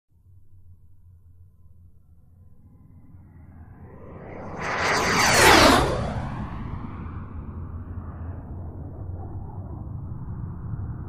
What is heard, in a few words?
Gnat: By Low; Short, Rumbling In, Flare, By And Short Away. Medium Perspective. Jet.